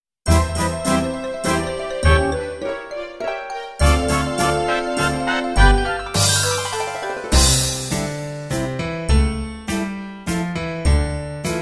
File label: waltz